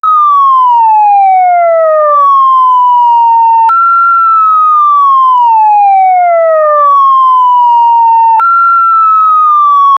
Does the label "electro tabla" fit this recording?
No